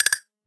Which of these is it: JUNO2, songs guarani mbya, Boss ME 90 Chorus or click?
click